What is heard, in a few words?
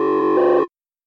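quiz sounds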